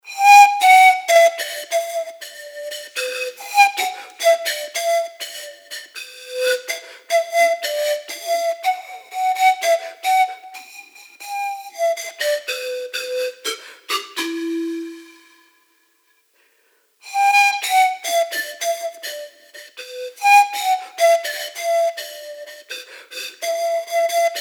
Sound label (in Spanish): MALTA Sikus agudo